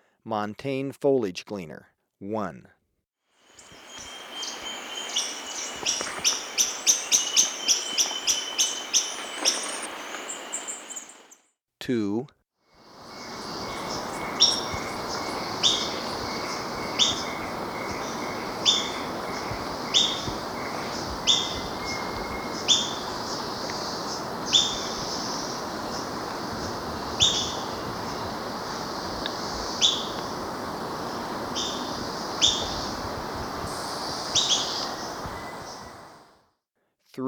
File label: Montane Foliage-Gleaner; S. America